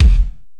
Korg x5, Drums

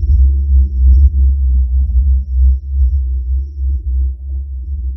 SYN BASS

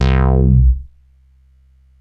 Bass Synths hits and octaves

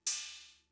Cymbal, Fricke MFB-522, Drum Machines

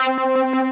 Synth, DnB One Shots

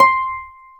HARP C6; AKAI S-Series CD-ROM Sound Library VOL-2

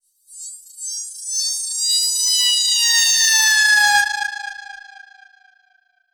Filter Proc, alarm siren samples, 120bpm

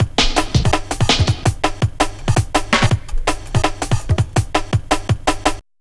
Combination A, programmed loops; Zero-G Jungle Warfare 1, 2 & 3

